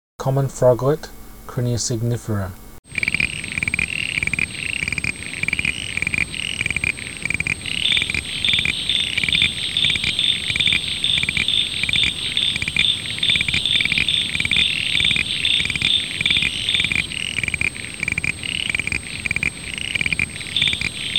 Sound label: Crinia signifera Common Froglet, Frog calls; Oaklands Wetland; City of Marion